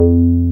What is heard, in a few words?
BAS 303 F3